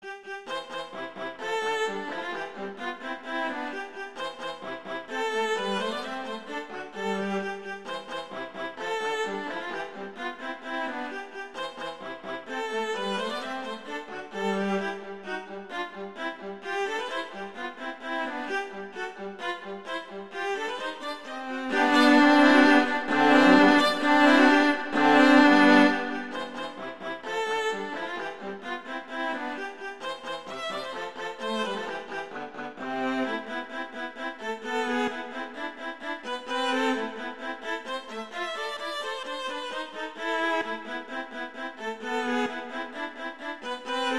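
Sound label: Duet, Rondo Allegretto, viola; Mazas; Duets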